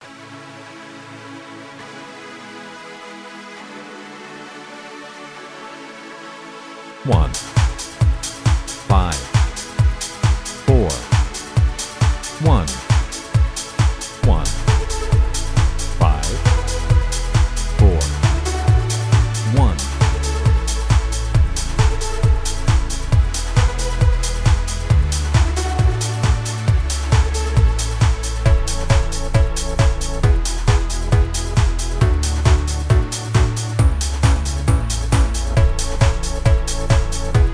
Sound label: I V IV I Dance A